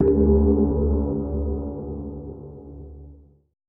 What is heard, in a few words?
GONG MUTE, TIBET; Best Service Advanced Media Trax Modern Composer; ETH TIBET